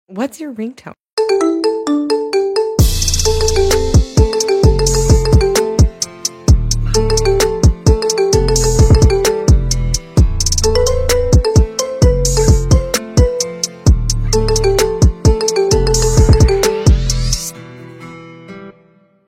iphone 13

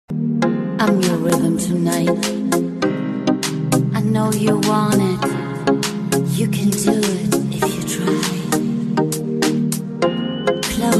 ringtone

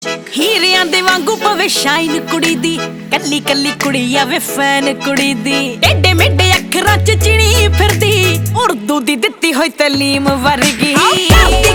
Punjabi

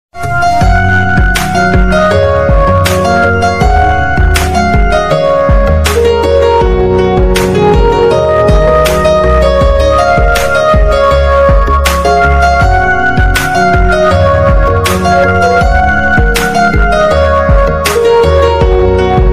Instrumental Song Ringtone

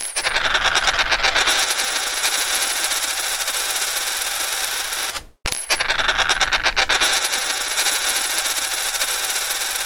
Coin Spinning on Glass

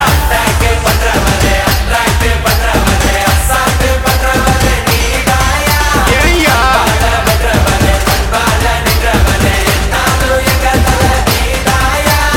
Tamil Movie Ringtone

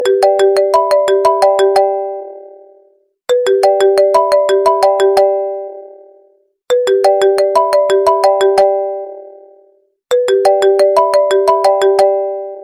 iphone sms

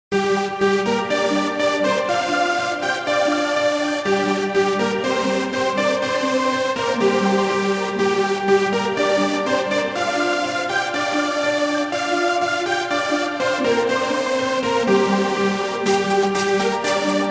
space ringtone

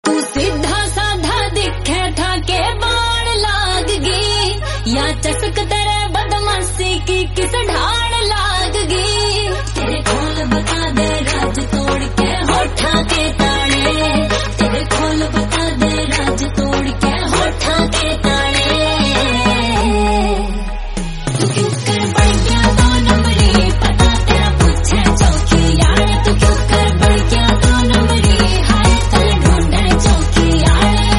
Haryanvi

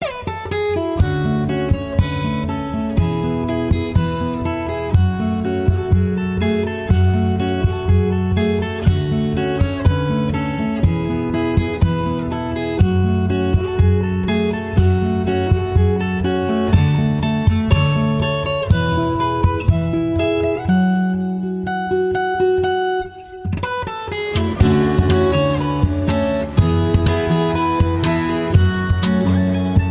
Guitar Ringtone

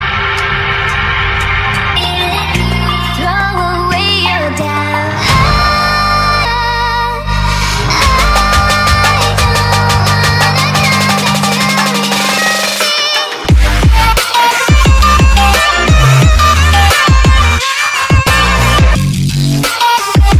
edm

